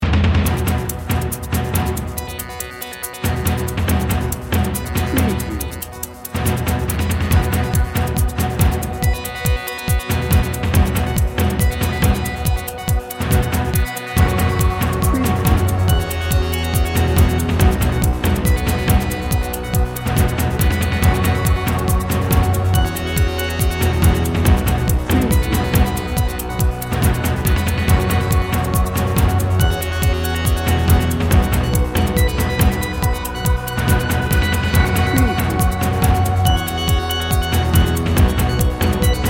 victory alternate

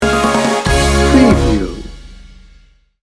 sting bumper

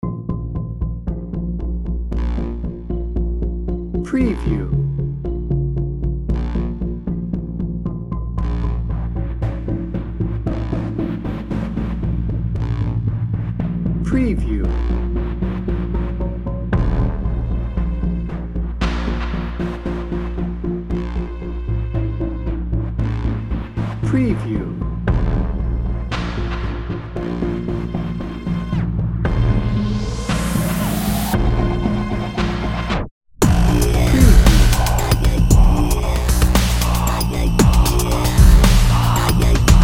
stalker menace

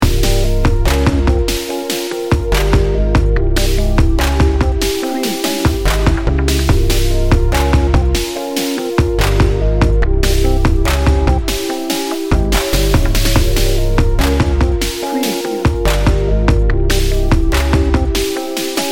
sinister dark hip hop loop

